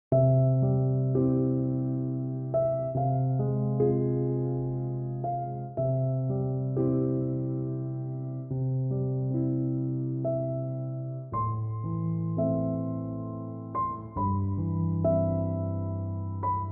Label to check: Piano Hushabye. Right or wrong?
Right